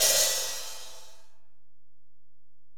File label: HiHat, Alesis DM-PRO